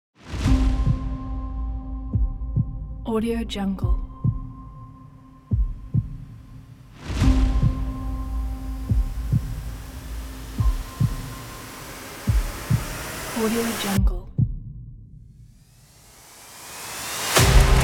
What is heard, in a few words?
action trailer